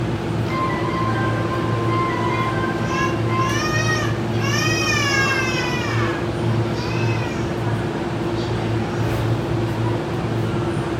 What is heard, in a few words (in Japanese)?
埼京線戸田公園北, train sounds